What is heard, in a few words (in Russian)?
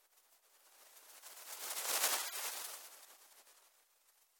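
быстрый пролет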